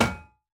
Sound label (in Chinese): 沉重核心 破坏声